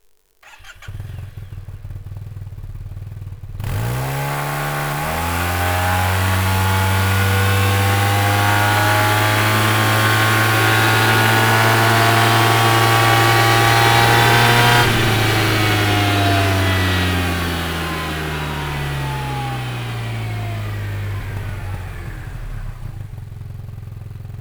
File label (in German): Serienauspuff